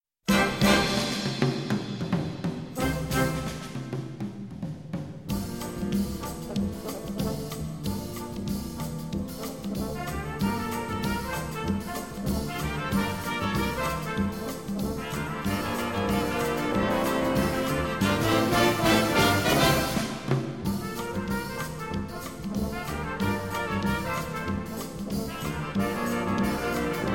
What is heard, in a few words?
latin brass